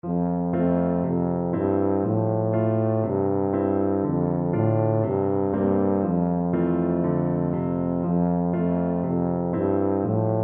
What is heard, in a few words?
auclair, tuba